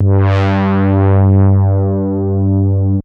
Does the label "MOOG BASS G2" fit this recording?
Yes